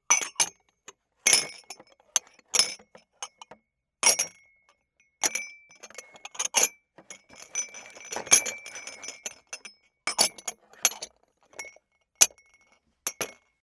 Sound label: dishes